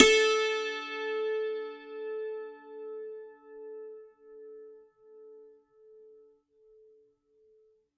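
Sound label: A4, dulcimer beat; SoundBytes; Early Patches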